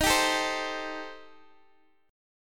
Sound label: D sharp 6th Flat 5th, Guitar, Open D